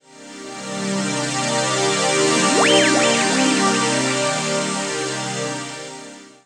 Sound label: Freedom Pad - 5A; Jungle; Pads; Blu Mar Ten; Jungle - 1989 to 1999